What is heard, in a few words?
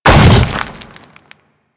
Hammer Boss Land Dirt, Footsteps; Warframe; Grineer